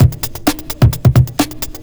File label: ELECTRO; USB Soundscan vol.25; Big Beat Drumloops; AKAI